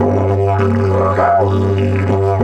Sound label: G 100, DIDGE 100BPM, Didgeridoo